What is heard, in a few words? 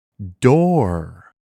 Door; おうちの中のもの; 【ピンクのフチのカード】